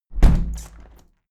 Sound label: window plastic squeak close heavy sequence